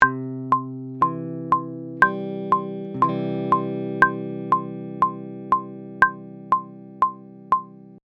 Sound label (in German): C-Dur-Akkord; C Major Chord